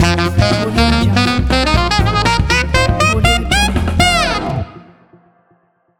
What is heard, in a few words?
funky sax